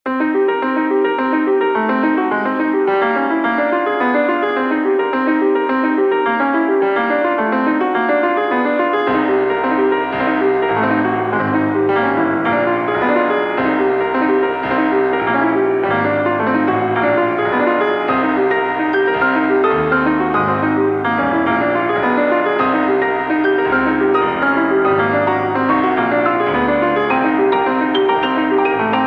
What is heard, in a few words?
pianosplosion